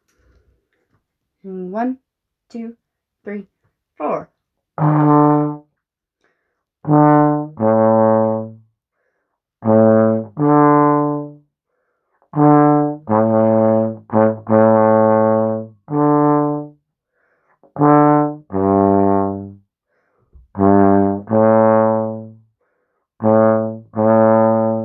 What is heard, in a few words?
Trombone Ode To Joy